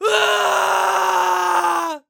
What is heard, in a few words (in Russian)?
ispug mujskoy